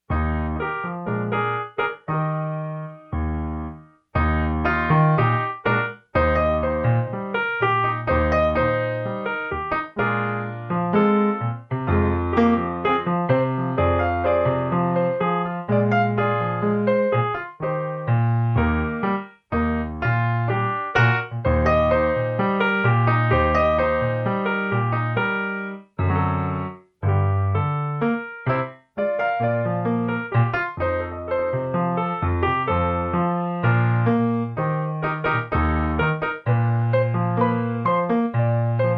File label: Tango Piano